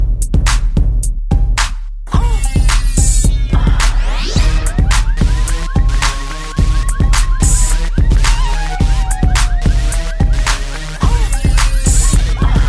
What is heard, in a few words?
Instrumental Song Ringtone